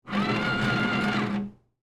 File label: Paper Towel Run, Office, Paper, Towel, Dispenser, Operate, Public, Bathroom; Paper Sound Effects; BlastWave